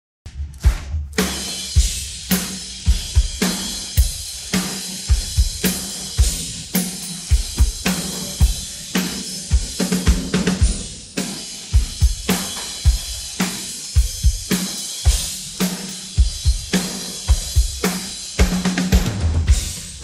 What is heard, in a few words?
pattern, drums